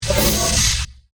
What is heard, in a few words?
Space Pneumatic, Scifi, Space, Pneumatic, Burst, Science, Fiction; BlastWave